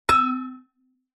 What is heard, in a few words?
impact metal hollow tube